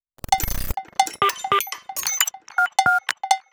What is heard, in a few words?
glitch fill